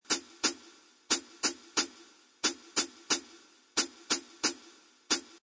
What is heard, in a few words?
Jazz hihat pedal at 180 bpm.; Drum Loops